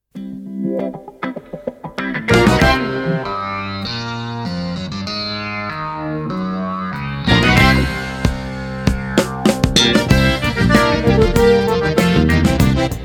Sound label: Disco Blues